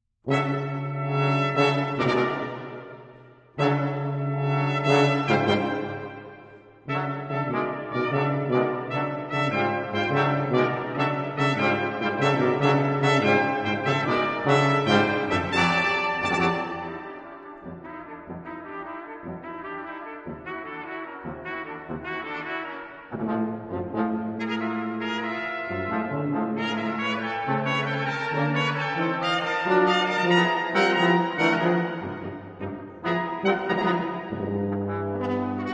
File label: fanfare for 5, brass ensemble